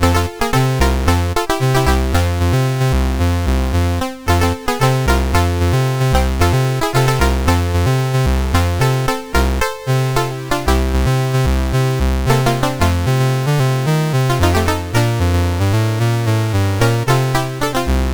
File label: Sloop John B, CSG8580R5